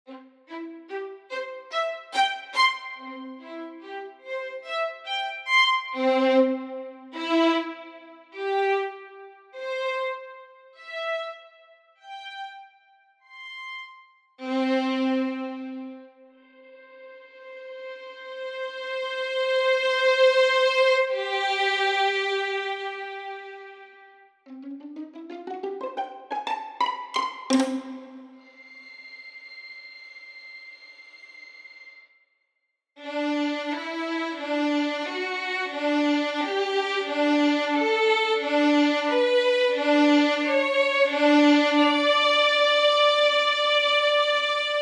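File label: chamber violins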